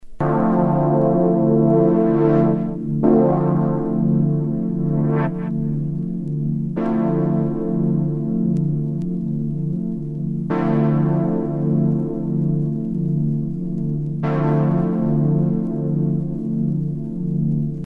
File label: Pad